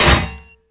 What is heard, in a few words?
cleaver hit world; tf2